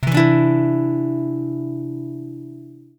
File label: Cadd9, guitar